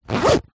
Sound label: cool bag fabric zipper